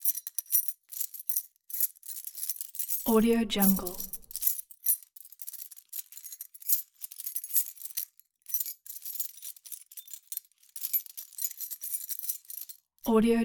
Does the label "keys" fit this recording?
Yes